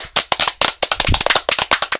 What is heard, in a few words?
applause; night market